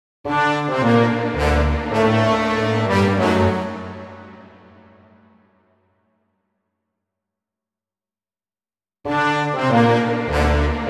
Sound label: wo Piano; Liszt